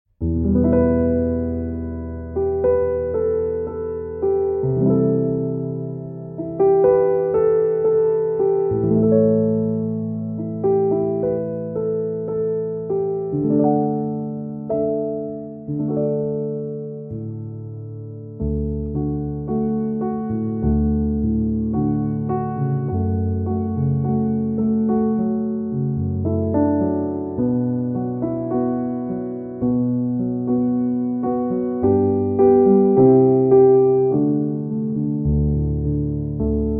Instrumental Piano

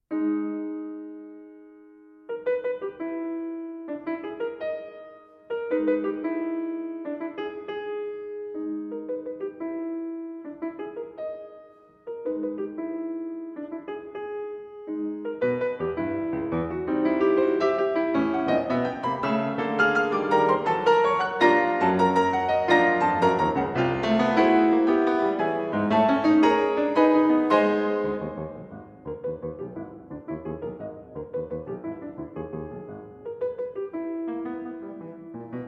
Old Pieces For Piano Humoreske

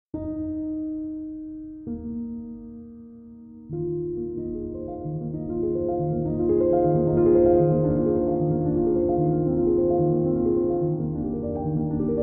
Solo Piano

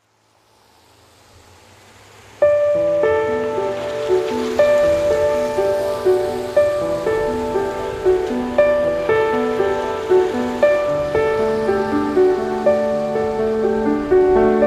Piano and Rain

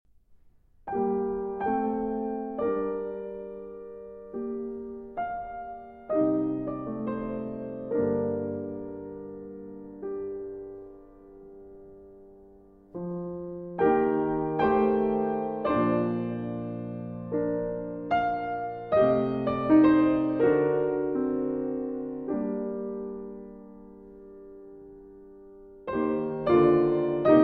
Song For Piano

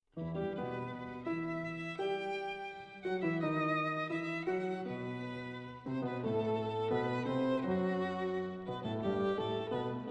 Sonata in E Minor for Violin and Piano

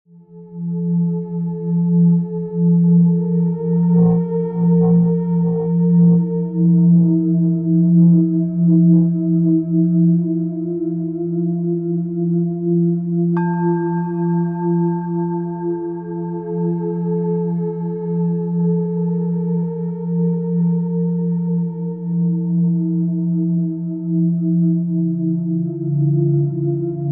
432 Hz Miracle Tones (432 Hz)

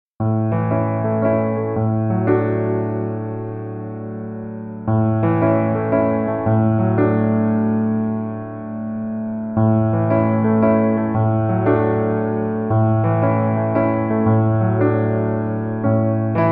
Instrumental

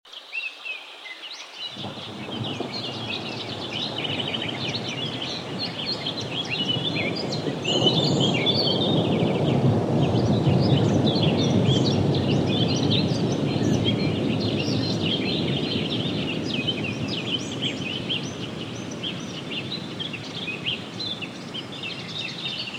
Thunderous Rainforest

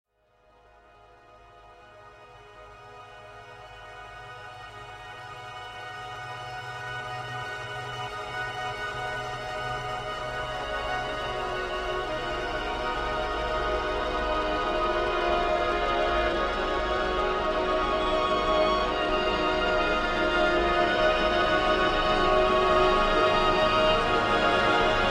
Moog

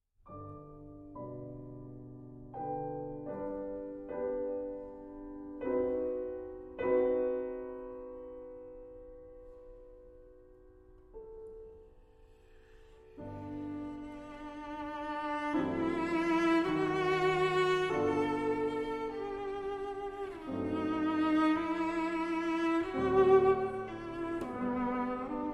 Pieces for Cello and Piano, Langsam; 1899 Version, Ed. Piatigorsky; Live at Schloss Elmau

